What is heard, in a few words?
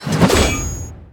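attack katana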